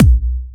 Electro Kicks; Bassdrums; Vengeance; Essential Clubsounds Vol.4

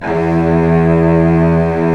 Vcs2 f Slo; STR Vcs 1-2 vb

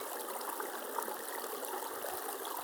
Waterway G Light

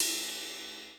ride, Millenium MPS-400

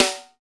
SNR MACH, snares; Roland L-CDX-01; Snares 3; Sn Modules 3

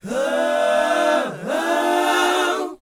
BOYZ A# E, Vocal Planet R&B Dance; Spectrasonics Vocal Planet

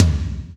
TOM TOM, Roland

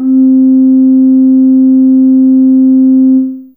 Wine Glas C4; Fairlight CMI IIe

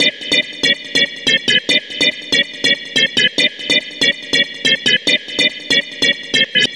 Lead E, club techno lead loops

